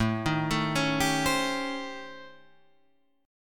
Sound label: A 7th Sharp 9th Flat 5th A, Guitar Drop D; chord